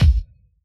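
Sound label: Bass Drum HipHop, Yamaha DJX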